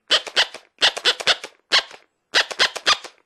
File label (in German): hund, Tierstimmen